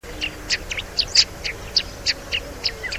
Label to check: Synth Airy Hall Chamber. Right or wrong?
Wrong